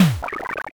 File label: door kick